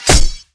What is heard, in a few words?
knife metal, Damage